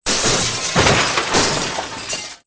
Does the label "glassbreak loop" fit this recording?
Yes